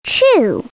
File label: CHEW; Get Ready for School, Charlie Brown!